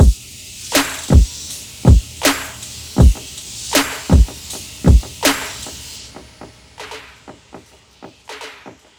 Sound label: reverbs rubber strings; triadizer; octo8r